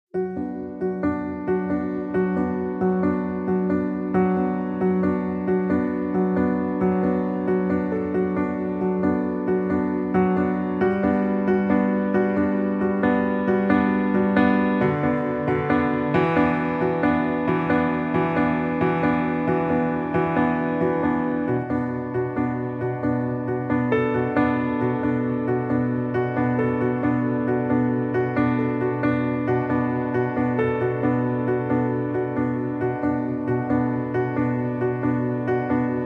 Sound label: Pianos